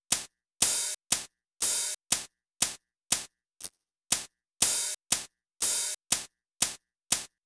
Hihat No Sample

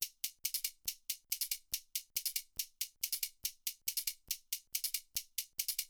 Shaker Key

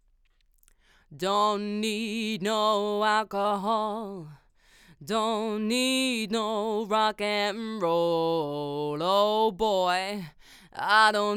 Vox Shoot MXL V77 S Card

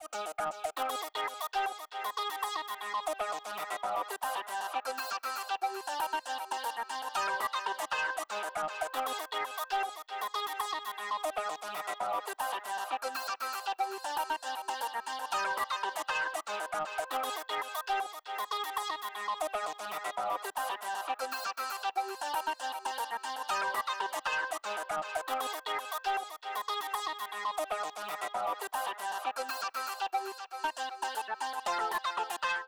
Motion Pad Solo

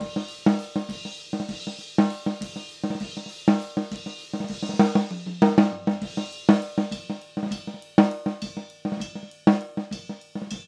Spots Tonal Poor Spot

